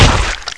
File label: Big Impact